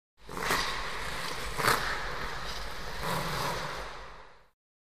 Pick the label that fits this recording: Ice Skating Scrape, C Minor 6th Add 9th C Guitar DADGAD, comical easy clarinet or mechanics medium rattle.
Ice Skating Scrape